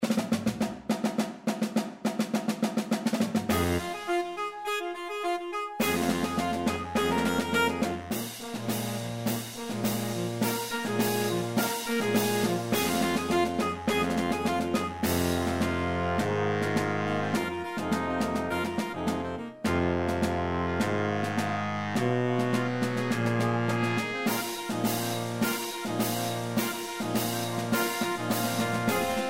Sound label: Marching Band